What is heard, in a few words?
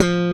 PULL F3, Bass; Sampleheads; Will Lee Bass Library; SPECTOR PULL